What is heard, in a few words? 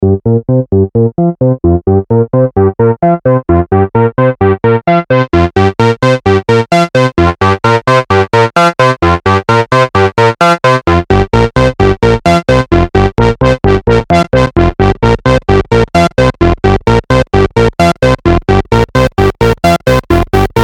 SH 09 vs. Microsynth; EHX MS